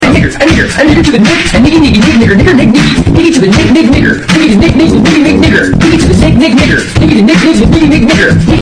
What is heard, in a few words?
funny word rap